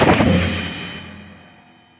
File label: fan of knives; weapons; dota; phantom assassin